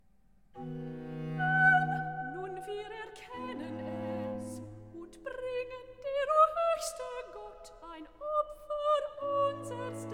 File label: Recit (Soprano); Preise Jerusalem, Den Herrn, BWV 119; Kantate Ratswechsel Leipzig 1723